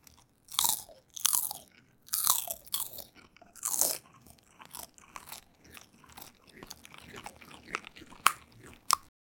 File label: asmr eating crunch